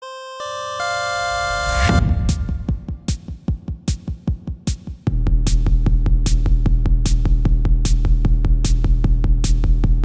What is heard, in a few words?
Instrumental; Friday Night Funkin A Nonsensical Friday Night linux macos online windows gamerip 2023; Highlights